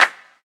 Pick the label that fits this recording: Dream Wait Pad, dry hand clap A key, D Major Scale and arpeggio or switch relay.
dry hand clap A key